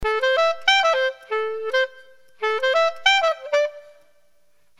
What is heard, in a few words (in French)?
clarinette